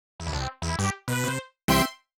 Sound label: end neutral; Rhythm Hell